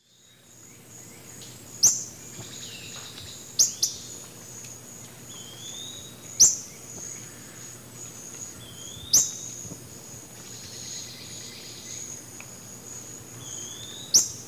Myiobius barbatus, calls and flight calls; S. America; Eastern Lowlands

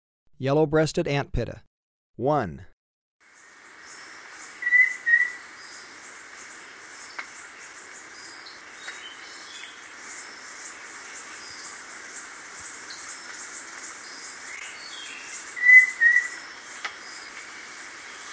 Yellow-breasted Antpitta; S. America

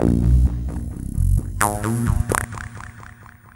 Synth, Techno

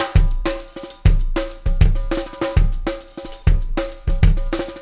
DRUM; DRUM SUGAR

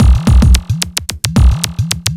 block rock kit

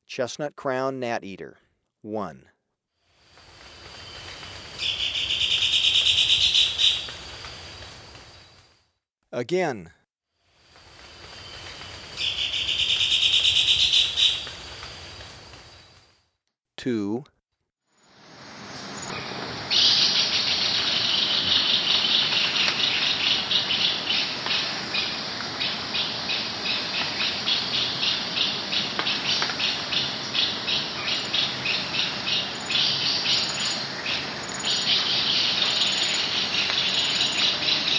Chestnut-crowned Gnateater, Birds of Ecuador; S. America

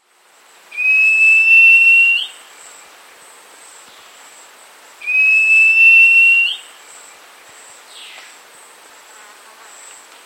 White-Masked Antbird; S. America

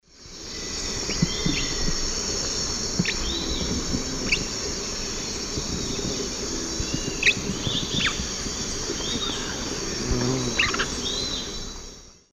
Pionus menstruus, flight calls pair; S. America; Eastern Lowlands

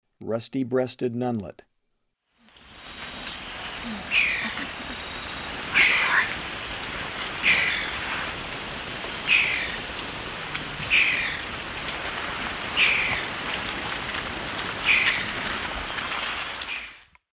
Rusty-breasted Nunlet; S. America; Eastern Lowlands

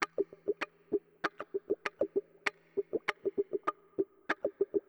Guitar, Funk

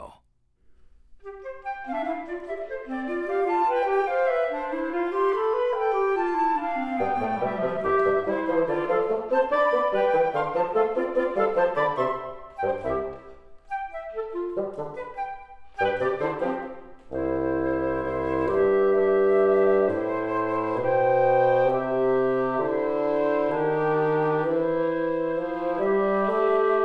Artificial Stereo

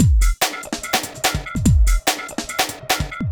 cracker 145

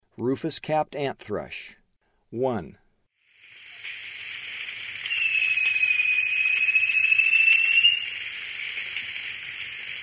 Rufous-capped Antthrush; S. America; Eastern Lowlands

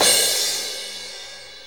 DRUM TOOLS

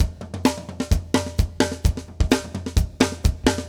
metal

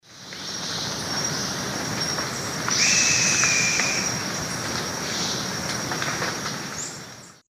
Piculus leucolaemus, call; S. America; Eastern Lowlands

